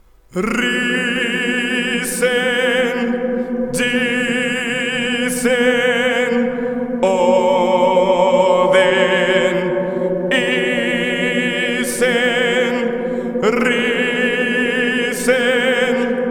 Warrior Song

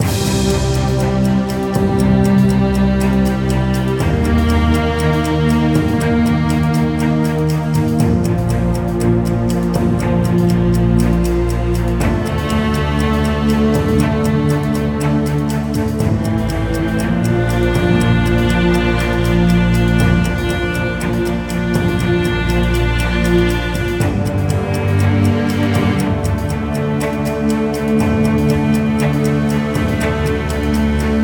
music menu loop; highrise heroes word challenge

